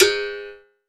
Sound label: Berimbau Open; Roland BK-5; Percussion